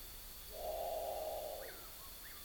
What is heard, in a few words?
Great Potoo